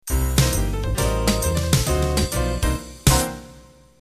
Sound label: Club Jazz End